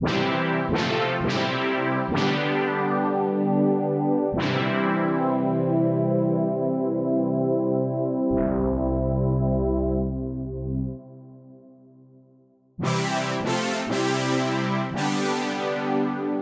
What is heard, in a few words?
brass